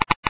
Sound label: geiger; player; source engine; hl1; video games